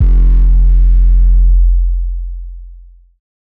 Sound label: Dusty 808 F, hip-hop drum kit; 99Sounds x Monosounds; Moon